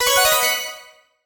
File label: play button